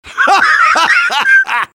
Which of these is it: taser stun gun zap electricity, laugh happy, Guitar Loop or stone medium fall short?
laugh happy